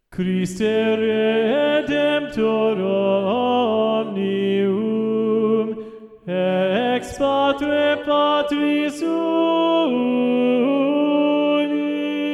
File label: Tenor; Christe Redemptor Omnium; Practice Room